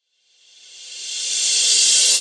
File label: Rev Radiant Energy